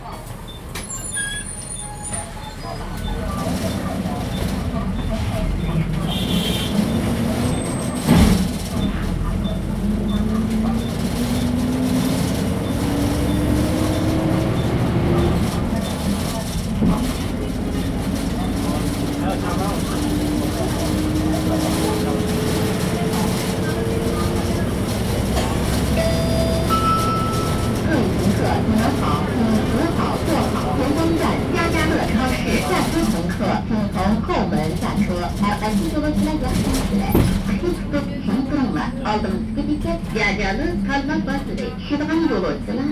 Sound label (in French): bus urumqi